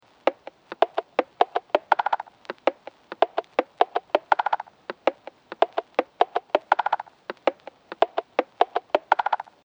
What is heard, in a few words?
Wooden Computer Machine Loop; Sci Fi Sound Effects; Looping Sound Effect; Fantasy, Horror And Sci-Fi Games; Andrew Fleming